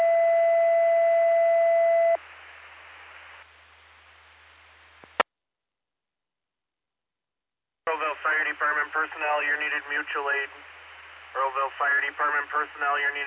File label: Fire; Earlville; TTDAlerts